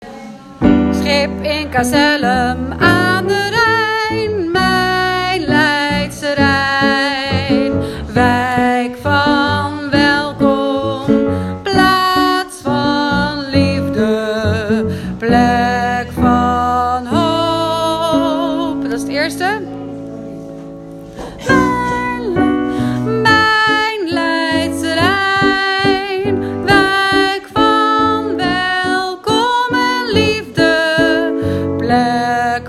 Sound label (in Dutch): 2e Sop